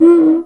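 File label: Cuica, Roland S50 Percussion; Drum Samples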